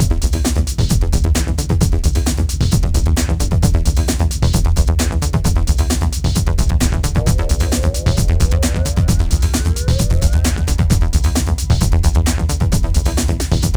Risen Up, 132 Emin, Hardcore Breakbeat; Big Fish Audio; Hardcore Breakbeat Action

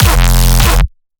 bass hit Malfunction F, Bass Stabs; Virtual Riot

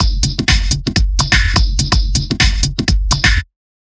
Broken Break, Drum Loops, 125 BPM; Sonic Foundry (Sony Creative Software); Underground UK House & Electro

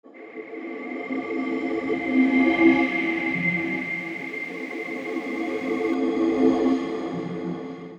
Breathy Loop (120BPM); cinematic drama; Loops; musicradar

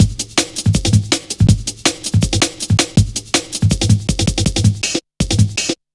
Drum Loops, Jungle; 161.5; Zero-G Jungle Warfare 1, 2 & 3; Skippy B; 90 s Sample CDs

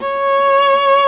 C#4 solo viola; InVision Interactive; Lightware VOL-1; Instruments & Percussions

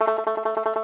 T. BANJO, Tenor Banjo, Plucked Strings

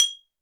beer, handy percussion

